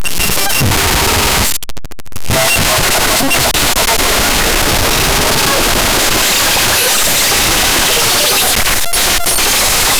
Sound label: please make a sound effec, scratching